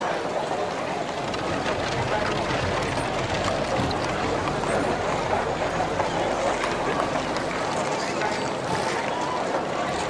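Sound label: City Medieval Large; Civilization V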